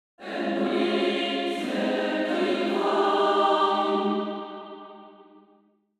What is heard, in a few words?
Angelic choir angels; embrace the divine